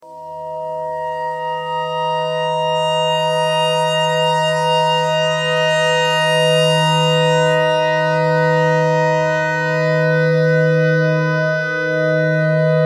clarinet notes e

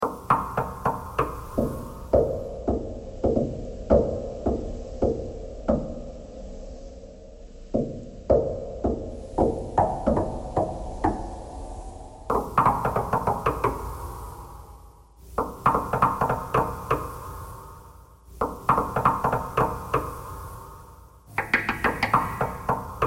knocking thread noise

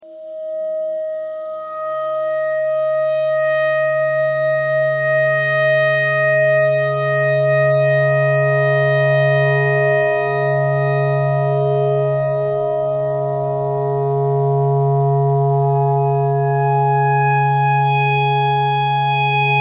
clarinet clarinet notes e thread pentatonic

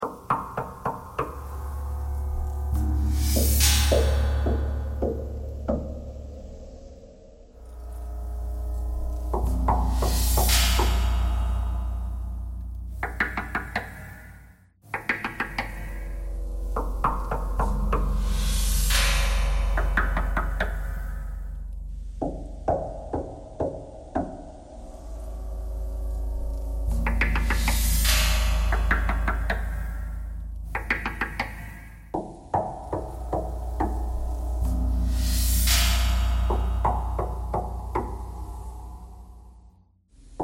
knocking thread noise

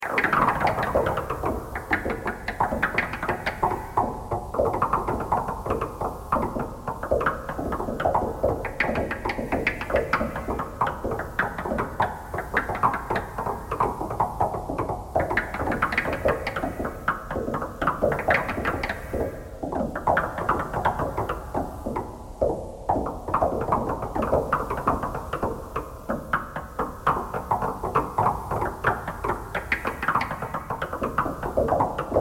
knocking thread noise all

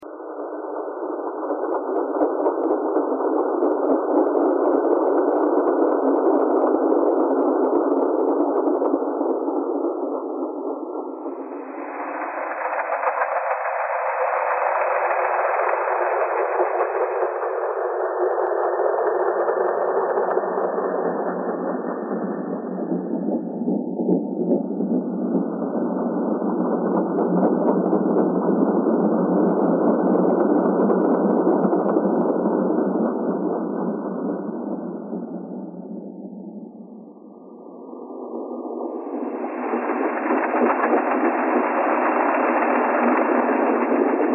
thread noise

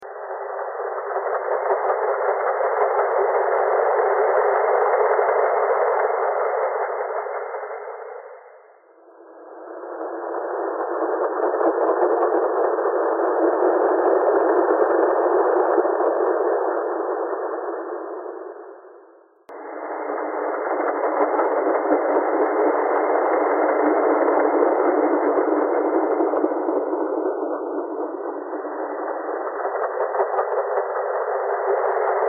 thread buzz

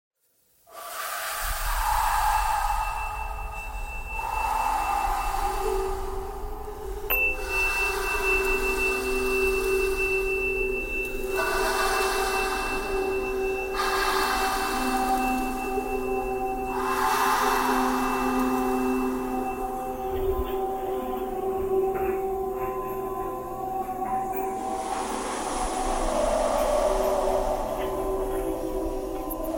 breathing thread noise

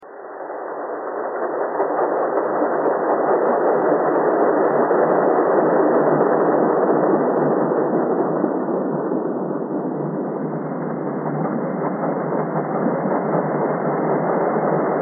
thread buzz

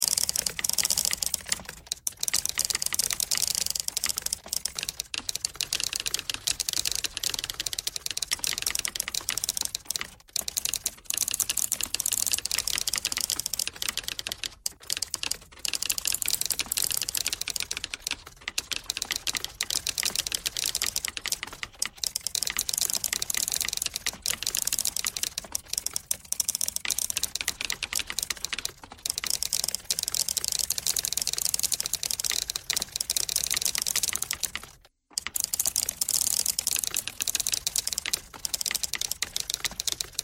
typewriter thread all

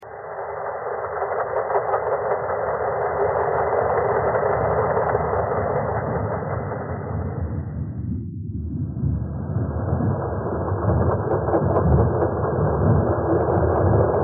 thread pentatonic

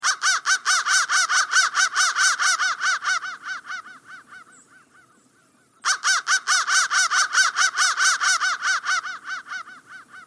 crow crow threads

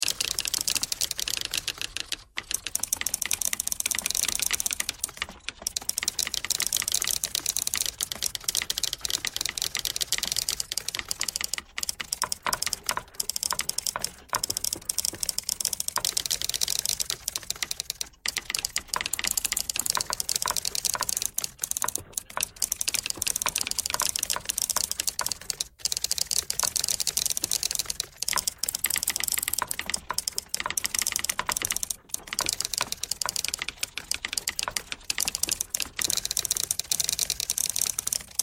typewriter thread all

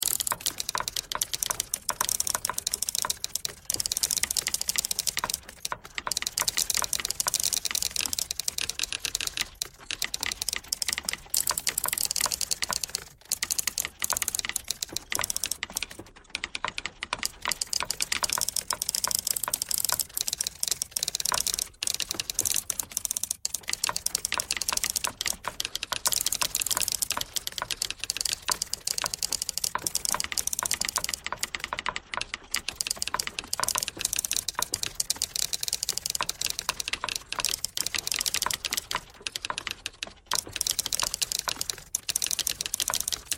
typewriter thread all

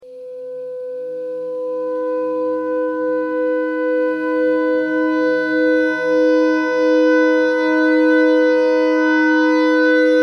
clarinet notes e clarinet notes e thread noise

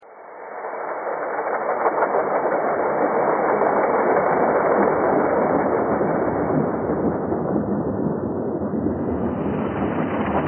thread pentatonic

